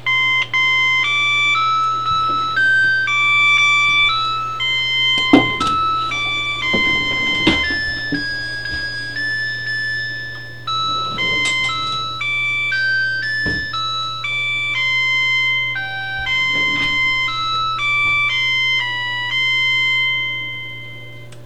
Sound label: cell phone sound stone